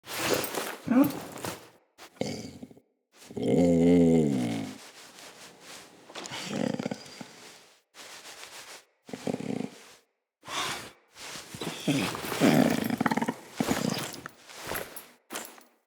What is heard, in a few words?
Wolf Cub Pet Sleeping; Cinematics; Orengall Quest